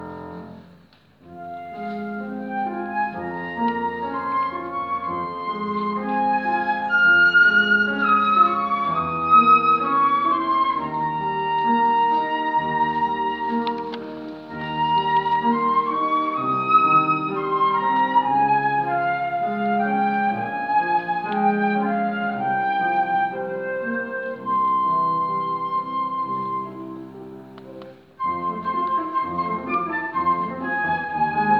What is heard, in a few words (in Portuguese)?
flauta piano